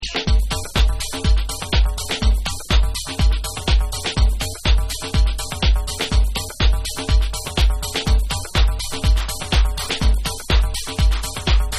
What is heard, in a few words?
DRUMS